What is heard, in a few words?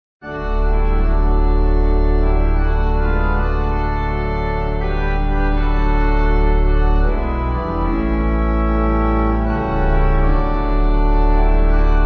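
Pipe, hymn backing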